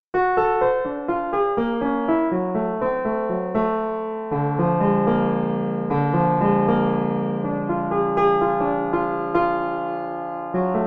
sqrt phi

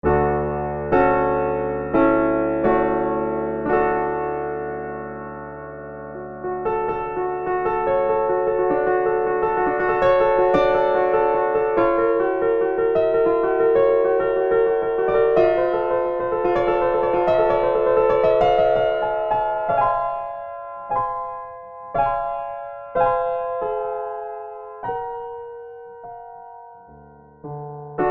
piano magic16

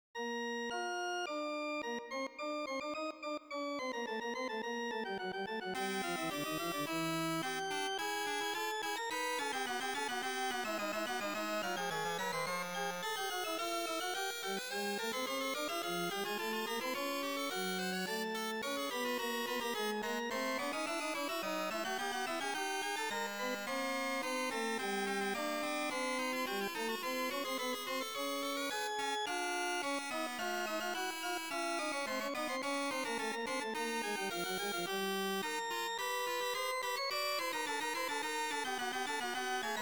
32edo Organ Pipe; Padanian Canon

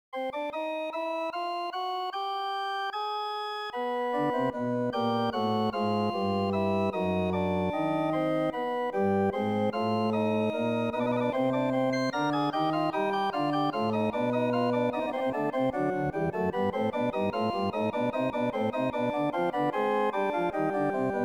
septimal tuning; Fokkers second alternate

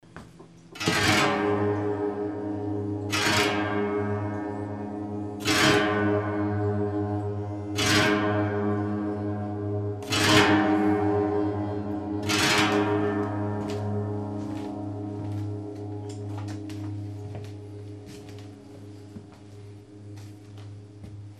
canon tuned unison

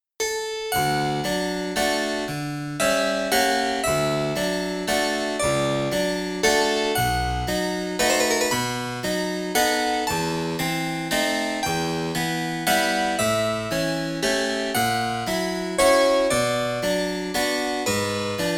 Chopin Nocturne 9 2 E31 Harpsichord

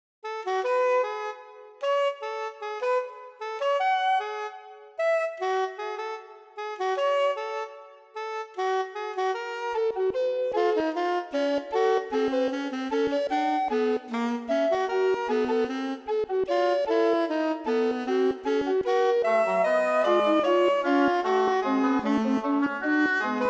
Septimal JI sax recorder tenor oboe cello

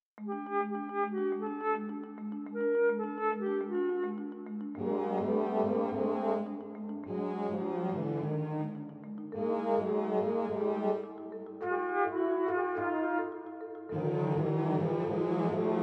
chamber 26edo; mikrokosmos 153

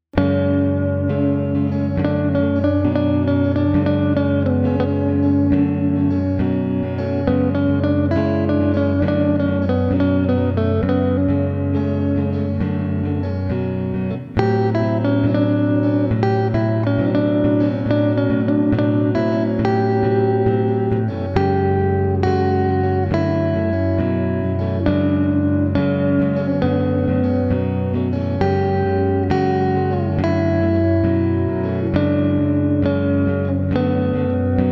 17edo duet

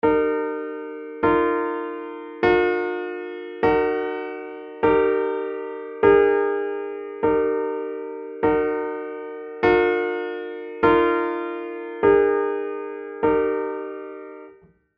17 cadences, 17-ET

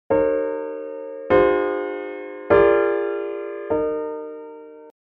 17 cadences, 17-ET

